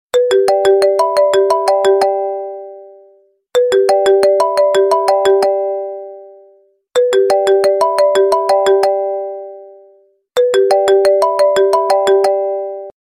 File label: iPhone Ringtone